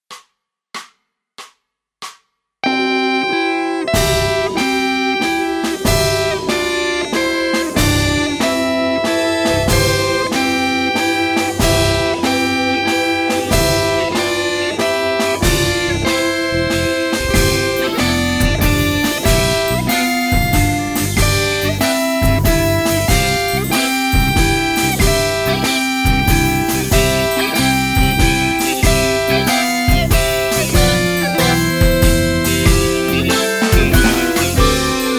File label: Forest Dub